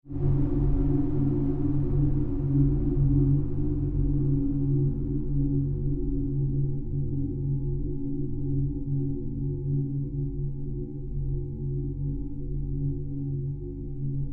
pulse fade long, Various sweeps, pulses and drones; Rise And Dive; Rises and Dives